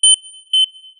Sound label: door alarm loop, subway trains; MetrostroiAddon; 722